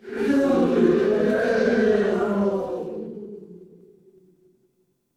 crowd murmur

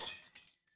glass shatter

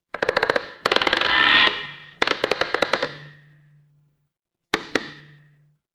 horror creak

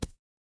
impact punch

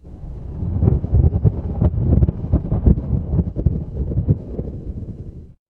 ambience thunder

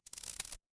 glass crack

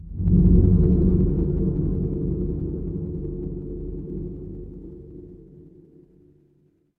horror drone

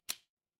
ui toggle

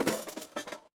impact crash

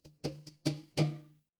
glass glass tap

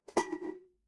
glass ceramic

